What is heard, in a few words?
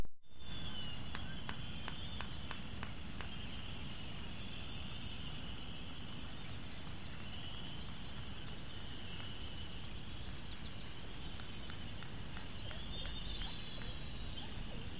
Albatross Runway